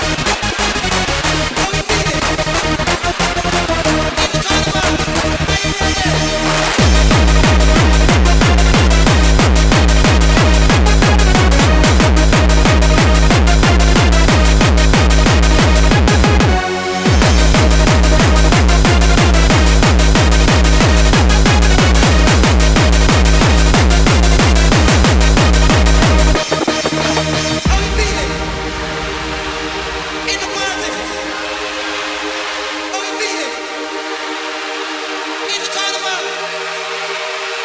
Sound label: Hard, vinyl